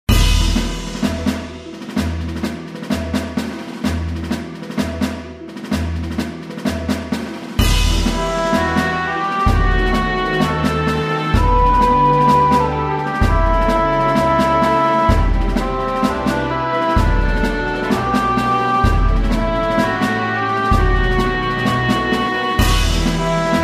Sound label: Battle BGM